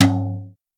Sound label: high floor tom sample g sharp